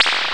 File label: Power Off, Industrial; Sonic Foundry (Sony Creative Software)